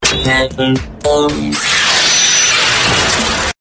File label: pen